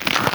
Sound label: frosted snow footstep; everness